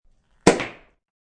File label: Champagne, Champagne cork blasts off!